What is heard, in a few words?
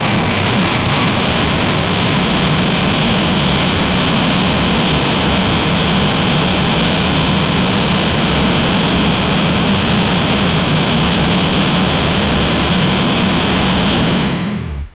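gear engine aggressive steam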